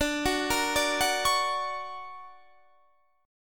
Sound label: D Augmented Major 7th down Guitar Standard; D+M7 arpeggiated; JGuitar